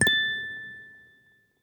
gamble level up; games; Corrida Romance; wazdan

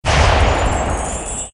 EXPAND; Red Hot Burning

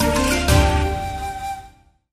cascade win; The Golden City

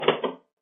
wheel start; Magic Hot; Wazdan

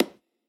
reels stop; Wazdan

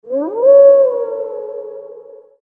wolf; Red Riding Hood

